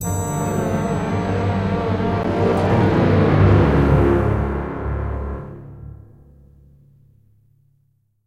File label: Success Stinger